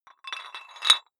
dumbbell put on rattling weight hit, fitnessstudio